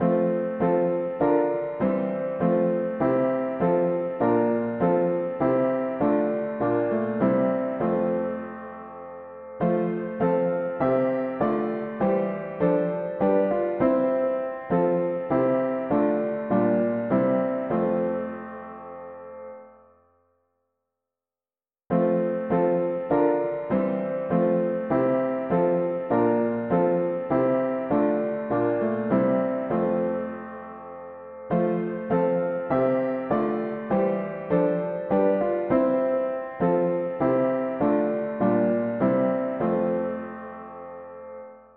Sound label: mono piano, hymns; Blest God and Father in Thy sight